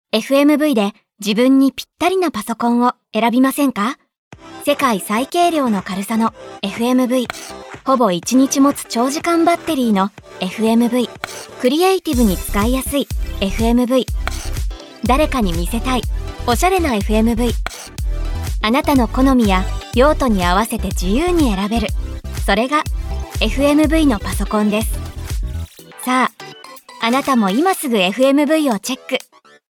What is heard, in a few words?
solo